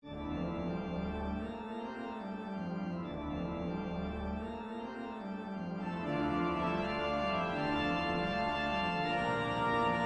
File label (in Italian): grande organo; la moldava; trascrizione pratica da concerto